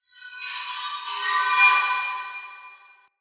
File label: Witch Station Ruins Soul Chime; Ambience; Soul Plane